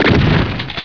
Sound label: FIRE SHOTGUN